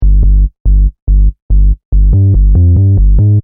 Techno-Trance Sub Bass Loop In G 142 BPM; Bass Loops

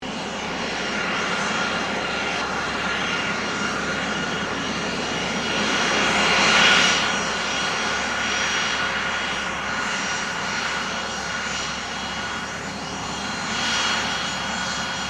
AIRCRAFT CESSNA CITATION CJ3 TAXI; Airplanes; Vehicles

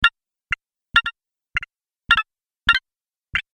Interface Sounds Collection

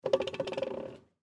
Turnstile Old Spin Rattle Short; Train Station; Industry; Train Stations; Blastwave

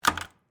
Padlock Shake, Foley, Padlock, Shake, Movement; Locks (Sound Effects:Objects); BlastWave

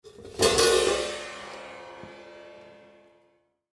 Cymbal In Case, Cymbal In Case Remove; Cymbals; Musical; Blastwave

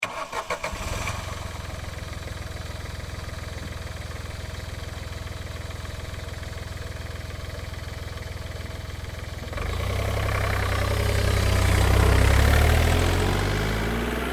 Car Smart Car Start Away Med; 2005 Smartcar Fortwo; Vehicles; Big Room Sound

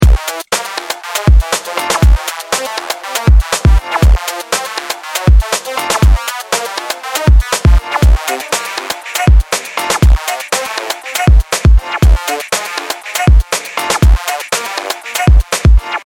Seamless Music Loop Chaos, Musical Sound Effects; Chaos Edition

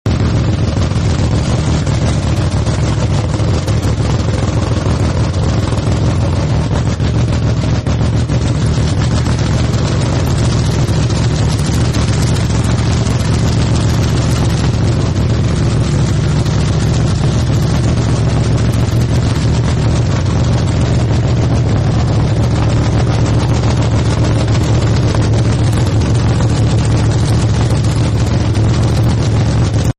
Hot Rod Idle, car; Hot Rod Idle Rev Loop; Cars; Sound Effects:Vehicles; Partners In Rhyme

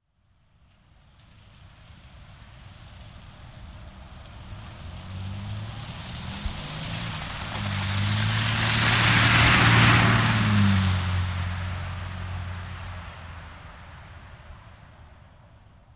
Vehicle Auto Pass By Snow Wet Road Automobile Car

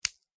horror bone finger snap, Finger/Small Bone Break; Breaking; Objects; McKinney Sound

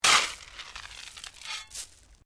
Digging Shovel Stones; Construction; Various digging tools and processes

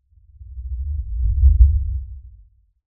Lfe Swell Low Bass Movement; Blastwave

